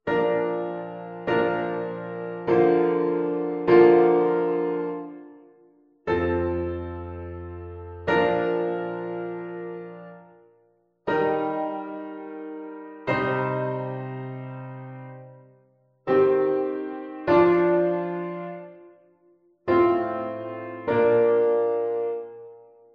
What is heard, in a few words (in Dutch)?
vierstemmige zetting; Zetting met kwint- en oktaafparallellen (begin van de Messe de Notre Dame van Guillaume de Machault, ca. 1370)